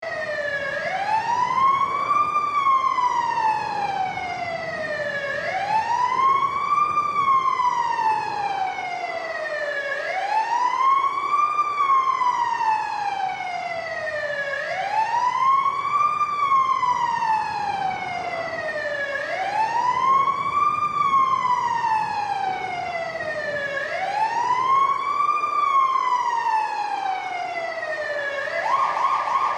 Fire truck siren

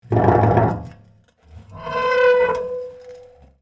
Metal fence short squeaky movement; squeak sound effects

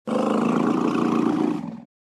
African elephant growling

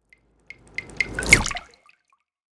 Cinematic water whoosh

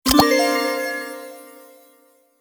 Complex tonal notification

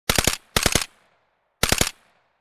Colt M4 firing multiple bursts

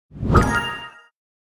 Bright positive transition

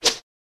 Fast whip like swishing whoosh

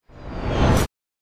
Incoming artillery rockets no explosion

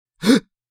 Male vocalization surprised sigh gasp and inhale

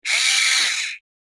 Electric cheese grinder; cooking

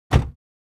Car door close interior

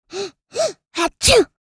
Little girl cute sneeze

